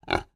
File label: animal pig kunekune grunt